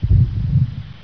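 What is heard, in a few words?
heartbeat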